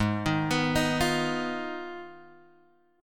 Ab Minor 6th Ab, down, Guitar, Open G

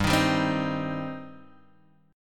G Suspended 4th Sharp 5th G down Guitar Open G

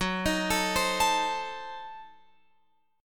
Gb Diminished 7th, Guitar, Open F; Gbdim7 arpeggiated; x,13,15,16,15,16

